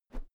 whoosh thick; swooshes-swishes

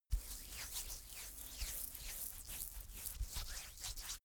rubbing hands; comedy-cartoon; koerpergeraeusche

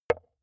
metal impact wood light

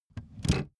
car seat slide forward short

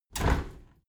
window handle squeak turn open halfway heavy; objekte; fenster

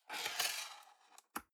cardboard box open unfold scrape single; objekte

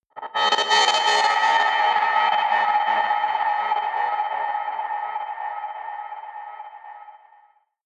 stutter breach, drones

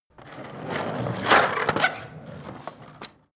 skateboard indoors drive heavy; sport freizeit

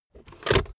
car backseat table pull up fast hard

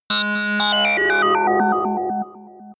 sci-fi dark planet, digital

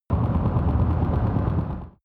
extrinsic buzz, energie

